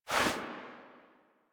Speargun Throw Release Foley; Warframe

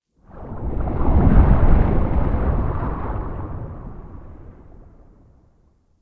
Cave Underwater Whoosh; Ambience; Warframe; Stalker Cave

